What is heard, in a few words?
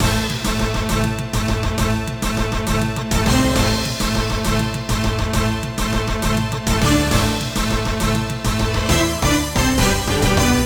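background music skill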